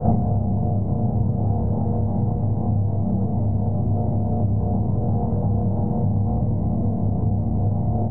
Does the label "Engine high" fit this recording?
Yes